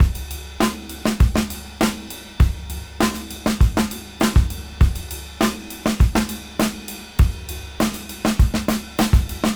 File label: Old School 101 bpm; AMG - Keith LeBlanc Phat Butt Jams